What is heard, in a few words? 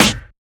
Snare; FLPRO